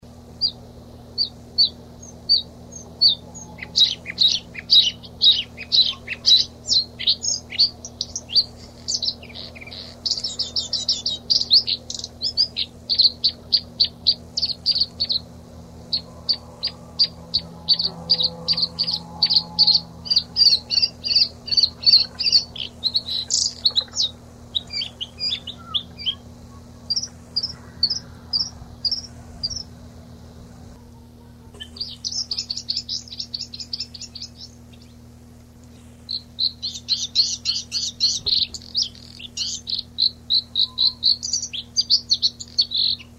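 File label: Luscinia svecica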